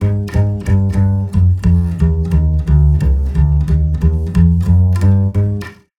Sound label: bass mix